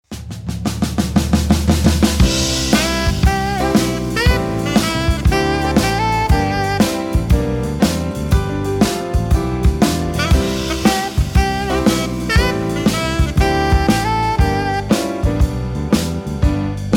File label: Jazz Band